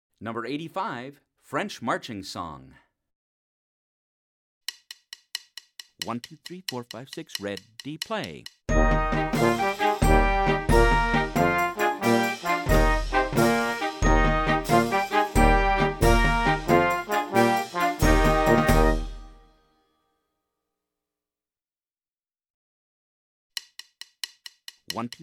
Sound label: french marching song